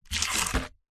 foley wooden box large short slide gritty concrete